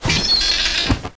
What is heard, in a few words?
Hopper Die